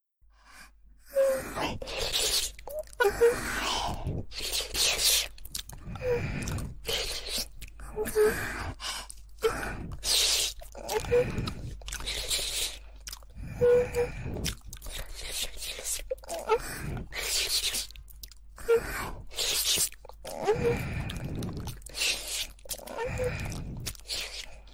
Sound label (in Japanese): お姉さんの唾液たっぷりな癒しの耳舐めASMR